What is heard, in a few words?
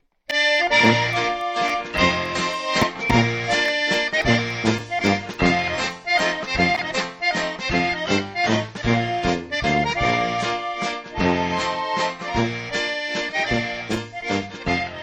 waltz; callers